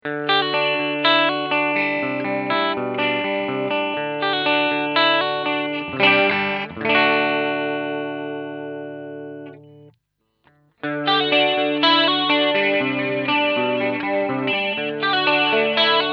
Red Twister Dry Soft Heavy Flange

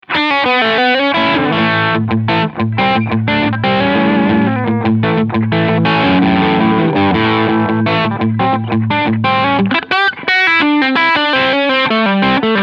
Normal Crunch

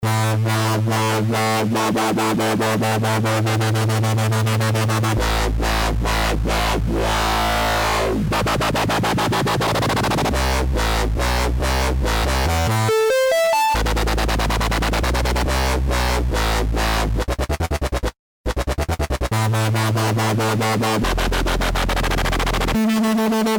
Dubstep Wobble Bass

